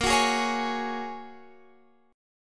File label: Bb Minor 7th Sharp 5th, Guitar, Open F; x,17,18,15,16,15; strummed; chord shape